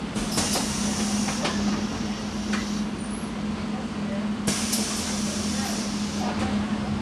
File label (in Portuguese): Porta de Onibus Transporte Publico; portas; Colecao Sonora do Cerrado